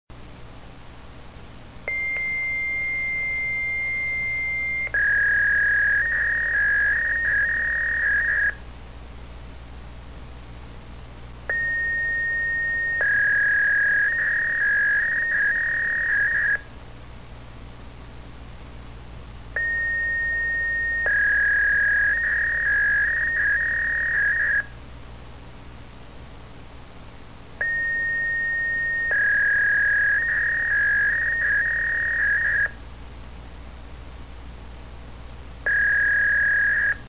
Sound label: fax